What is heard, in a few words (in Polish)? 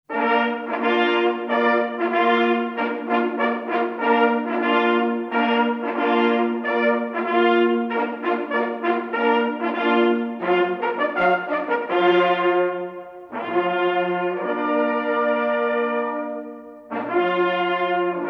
sarna na rozkladzie, sygnaly